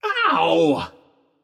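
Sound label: nonverbal ouch; smite; voicepack; merlin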